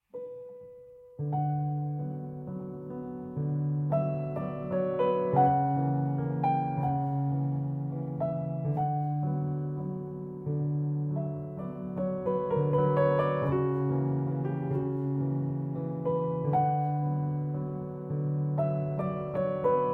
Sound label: Waltz in B Minor